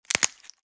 Foil blister pack pressing tablet or pill out; medicine

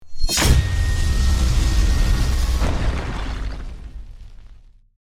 Sci-fi weapon metal melter long burst

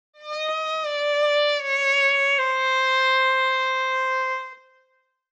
Cartoon fail

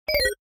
Simple notification alert

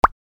Clean notification info pop; shorts sound effects

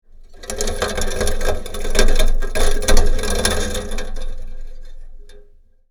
Metal gate rolling sliding; squeak sound effects

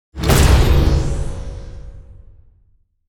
Intense shockwave powerful impact

